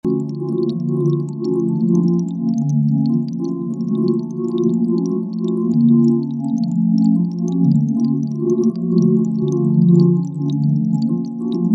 synth grainbrain; grainbrain by xnullx

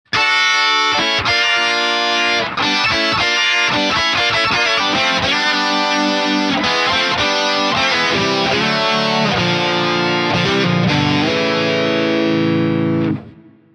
harmonizer; twin pitch; audyo engineering

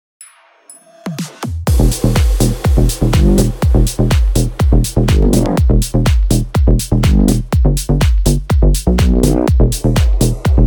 loop engine

